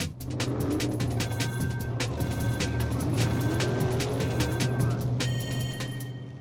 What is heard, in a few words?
race intro; Wacky Races - Sling Races; NextlevelGames